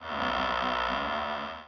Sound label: Windmill Creak